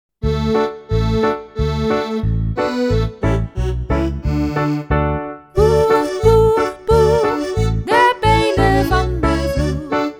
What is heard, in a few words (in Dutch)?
boer boer boer met zang